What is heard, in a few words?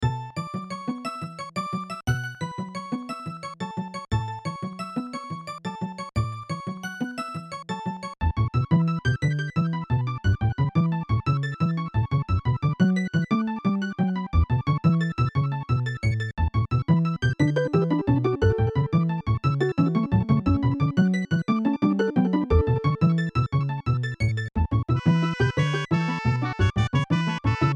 before, MatrixReverb